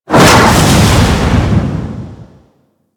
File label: Teleport Out; Mods; Deja Vu